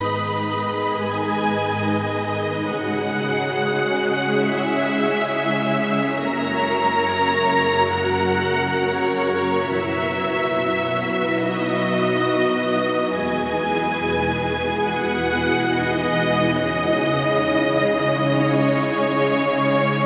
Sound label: best english most relaxing ringtone